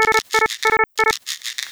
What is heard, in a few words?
Drug delivery, alarms; J Edworthy 2019